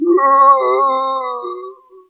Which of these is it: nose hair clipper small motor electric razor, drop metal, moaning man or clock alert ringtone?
moaning man